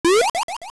Rocs Cape; Floating with Roc's Cape (plays after Link Jumping)

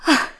Sigh; Hilda sighing lightly; zelda

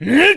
Shout; Ganondorf; Unidentified shouts

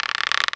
Rope Swing; Link swinging on a rope; Zelda

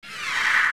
Lurk; Poe Sister; zelda; OOT

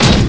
Mine Cart Land; A mine cart landing

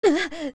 Young Gasp; Link gasping after a jump; OOT

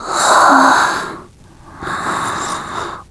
Sleepy; Toon Link; Link turned sleepy; Zelda

